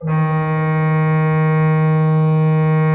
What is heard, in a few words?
D2, Cathedral Organ; ILIO; Propeller Islands